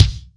KICK, GM drum set; AKAI S-Series CD-ROM Sound Library VOL-8

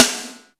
SNR ROM; Back In Time Records - Big Bang

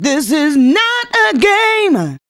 FEM HO, Female Hooks; Sound & Vision - Gigapack II CD 1

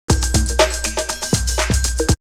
Percussion; Coldcut's Kleptomania VOL1

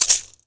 SINGL RATTLE; Best Service; World Colours

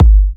G, kicks; markus hakala kicks

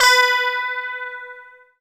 GUI CLEAN, E-Guitar

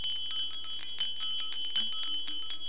CHIMES, JAPANESE PERCUSSION; AKAI S6000 CD-ROM - Volume 5; Ethic

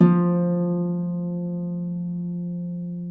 F2 CELTIC, HARP; Northstar - New Gold VOL-2

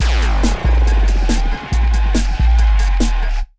Big Beat Drumloops, 140

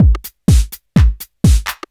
loop 125bpm, House Drum Loops; E Lab; XTc Files of House; 122-134bpm

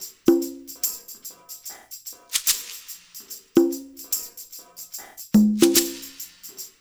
percussion loop; InVision Interactive; Percussion Slam; KWICKIE

